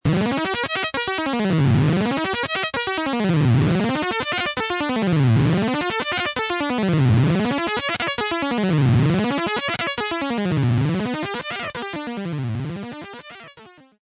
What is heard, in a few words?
arpeg